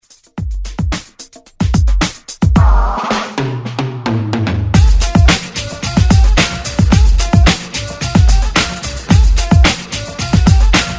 90s redrums

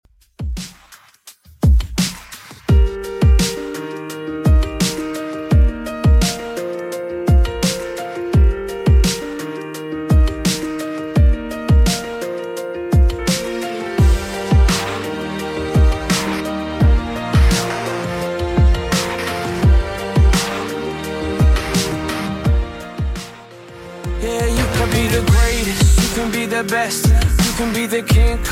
2ks Redrum 85bpm

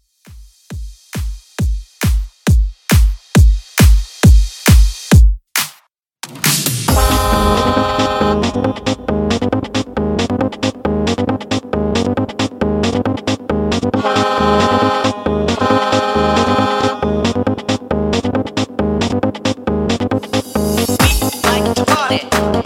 ReDrum 136Bpm

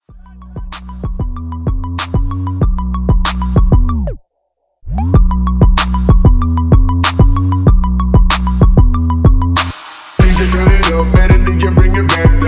Quick Partybreak 95

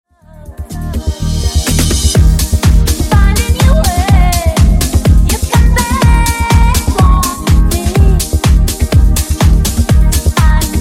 Funk Nu Disko 124Bpm

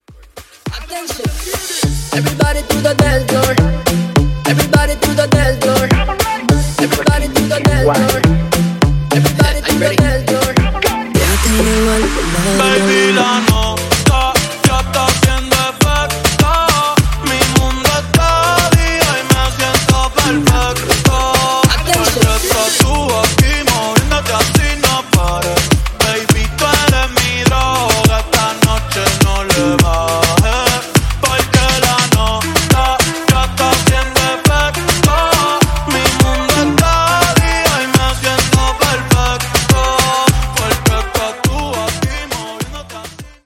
Reggaeton, 103; Mash up

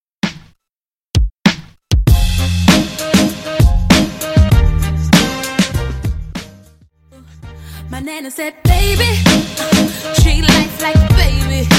Redrum

